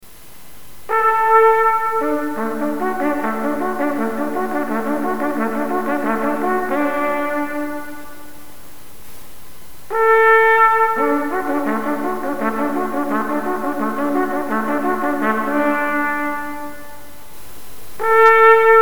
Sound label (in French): trompette exercice, trompette naturelle